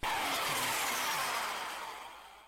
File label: launch ball; BALLY; sfx; TWILIGHT ZONE; Pinsound; Twilight-Zone-Full-Reorchestration